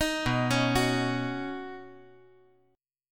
B Major Add 9th, B, down

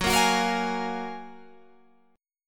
Gb Major 9th, down, Guitar, Open G

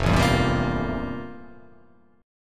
F Major 11th, chord; strummed; FM11; 1,0,2,0,0,2,0,2; JGuitar

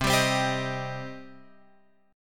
C Minor C down Guitar Open F; strummed; JGuitar

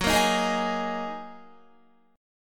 F sharp 6th Add 9th F sharp Guitar; F#6add9; strummed

